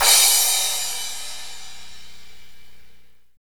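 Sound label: cymbal crash, Roland; Northstar; Drumscapes Roland; Cymbals 1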